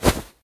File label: fire; CosmicRage; combat